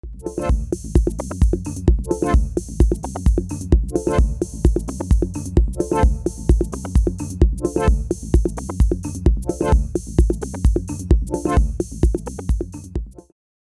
Rossum EM Control Forge Juno6 MC202 Korg M1

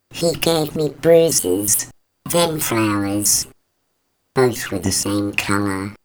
Vox Formant Up

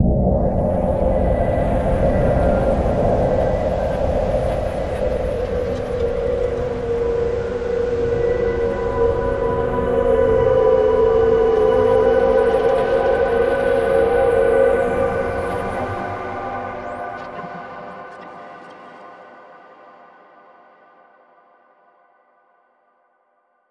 Scenic Hybrid Instrument Triple Layer; Reason Studios Reason 11

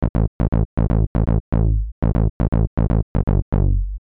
Bass

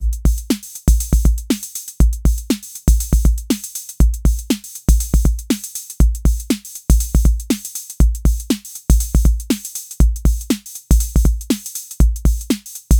Roland TR-06 Boutique Simple Beat Shuffle

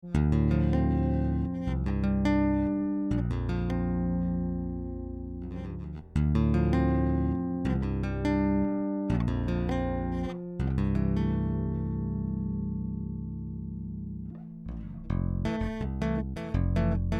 active tapping

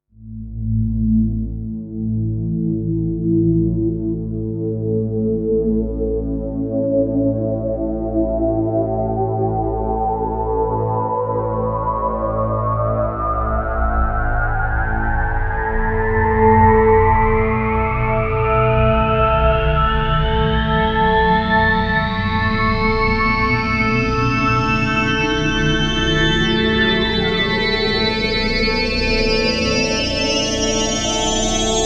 Rise Detune

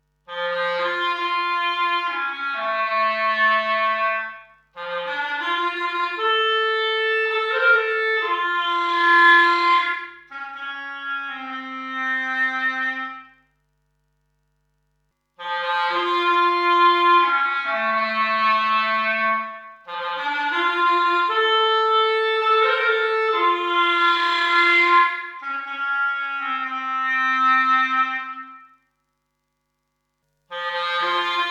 logidy epsi reverb 480 clarinet

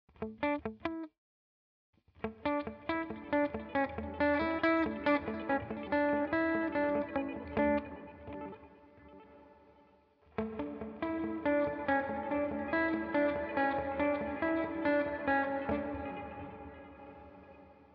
UAD DelVerb Tape Mod Hall

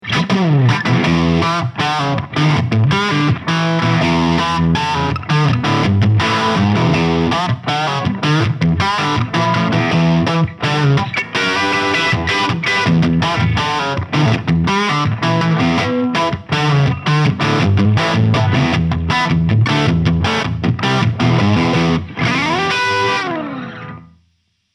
Harley Benton Mighty 15TH Crunch Humbucker

